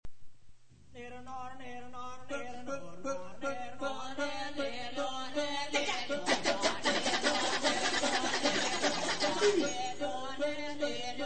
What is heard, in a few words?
kecak